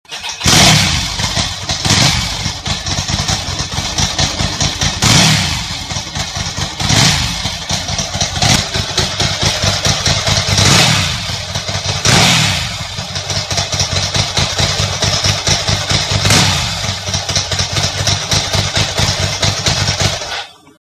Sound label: m900 stock, Ducati